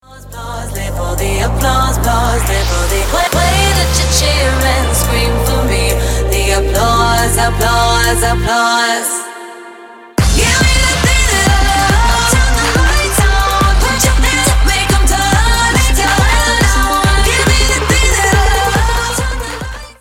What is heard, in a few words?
applause